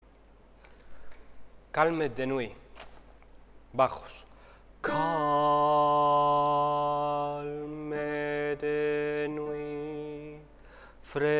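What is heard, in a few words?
bass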